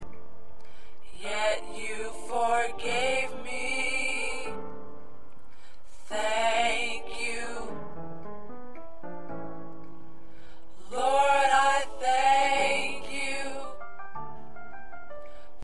Tenor

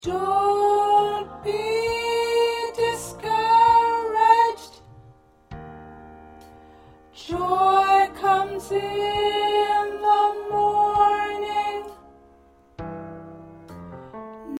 Soprano, choir

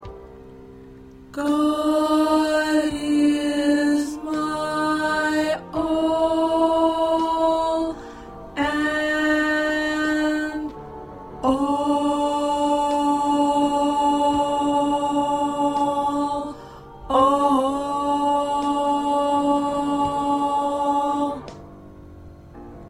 2nd Tenor

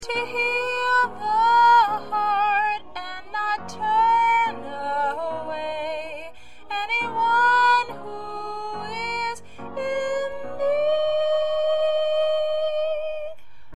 Lead Soprano

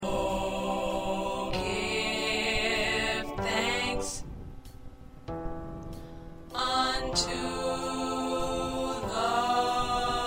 Tenor

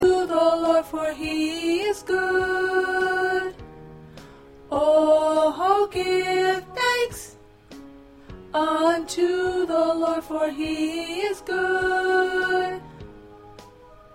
Alto, choir parts

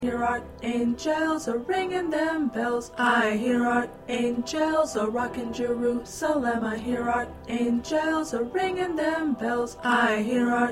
Alto

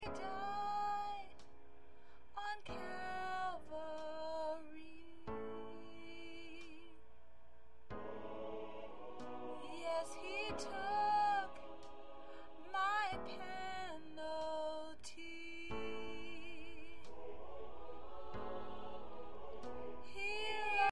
Lead, choir

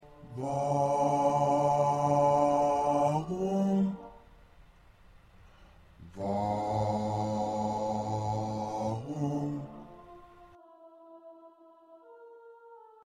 Bass

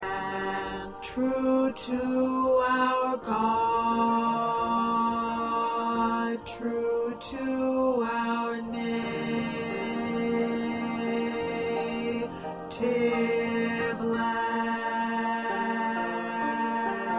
1st Bass

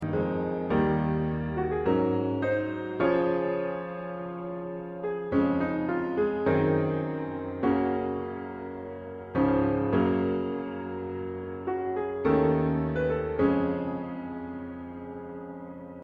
Piano

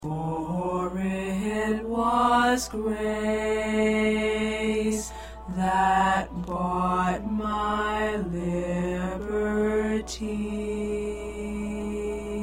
2nd Tenor

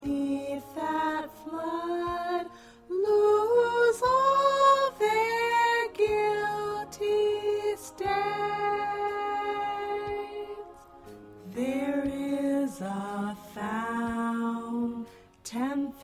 Soprano

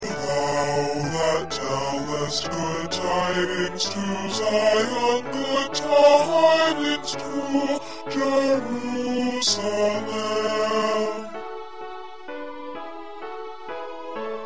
Bass

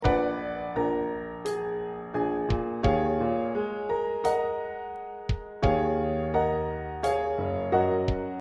Piano Drum

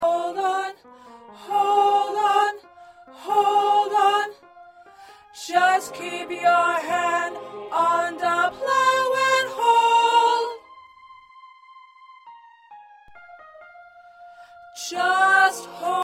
2nd Alto

